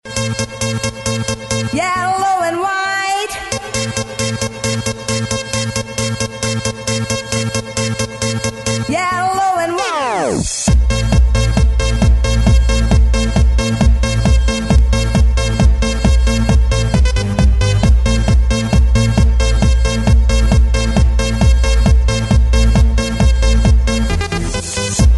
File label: Vocal Mix